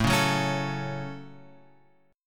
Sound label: A 6th, Guitar, Open F; strummed